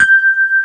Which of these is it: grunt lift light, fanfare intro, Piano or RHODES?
RHODES